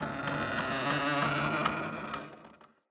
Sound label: Cave Ocean Dock Creak